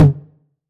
909 Tom Sweet; Goldbaby Tape808 & Tape909; The Tape 909

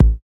BD, BD Dance

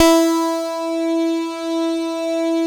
PERC BAS; Synclavier Sampler Library; KEYS AND GUITARS

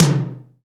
TOM2, Roland R-8

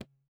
THROAT; Spectrasonics; Metamorphosis; THROATERS

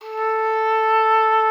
A4, OB D.AMORE; Xsample VOL-7

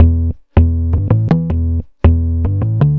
Bass, Synth Bass Loops

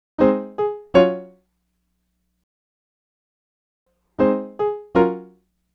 Piano, Acoustic Pianos

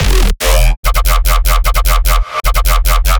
bass loop 150 E; Virtual Riot

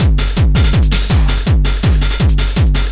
LOOP, Eurotech; Sounds Good

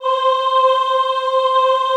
AHH C4, Classical Choir; Best Service; Extended Classical Choir

